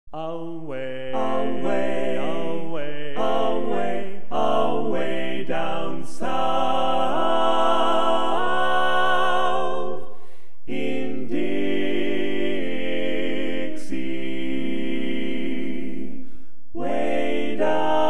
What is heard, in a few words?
Dixie, Bari; Classic Tags